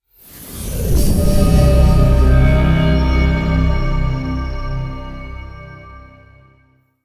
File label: Channel Menu; Metroid Other M